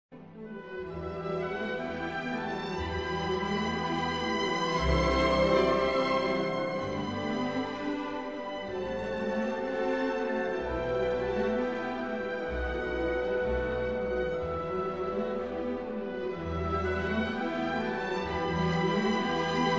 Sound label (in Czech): Hymna; Povltavské republiky